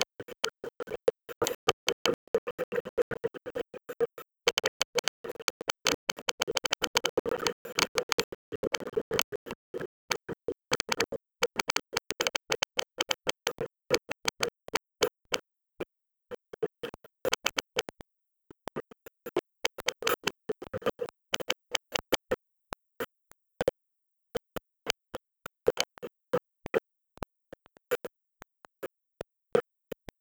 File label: UW soundtrack; Sipadan Video Soundwork; mono